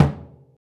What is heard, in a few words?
Surdo Mute, Percussion; Roland BK-5